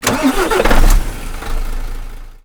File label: engine actros start